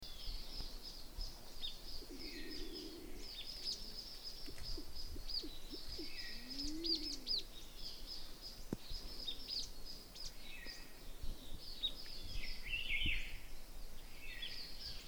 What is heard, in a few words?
Speckled Wood Pigeon Columba hodgsonii; Ladakhi name:Phurgon Thasuk